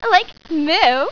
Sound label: moo